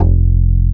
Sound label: Standup F, One Shot Bass